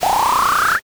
pour

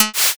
bow shot

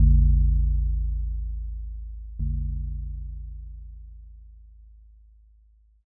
temple bell

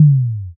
tom

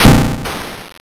thunder magic

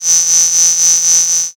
cicada

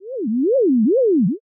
flashback out

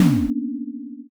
shock don

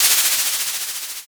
scroll open

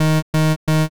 machine error

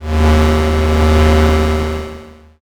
space engine

